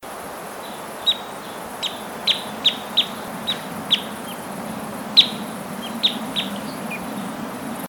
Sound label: Red Crossbill Type S17 - FCs